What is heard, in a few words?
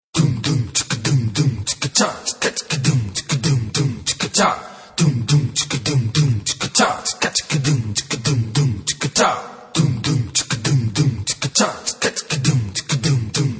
Vocal Saidi (fast)